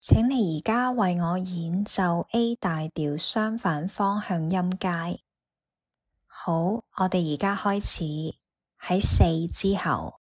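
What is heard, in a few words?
A major contrary motion scale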